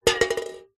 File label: can soda 200ml knock over on concrete; foley; zapsplat